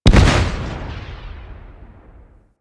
Space Fighter Rager Elite Missile Explosion; Warframe; DE; Vehicles; RailJack; RailJackEnemyShips; Grineer

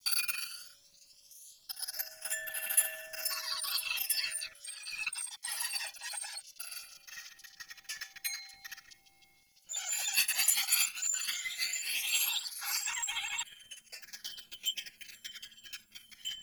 Cave Lamp Loop

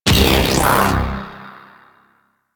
Gun Fu Activate; Warframe; Cowgirl

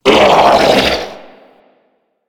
Corpus Scream; Enemies; Abilities; Warframe; Amalgam

